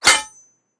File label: Sword Hit